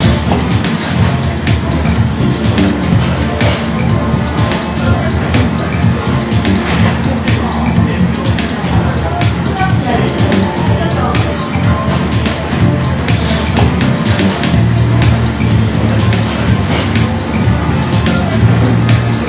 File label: Burger Ambient BGM; Smile